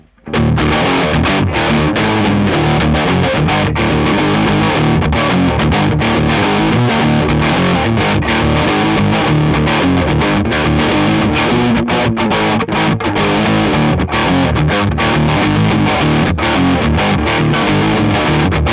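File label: saturated Les Paul; egnater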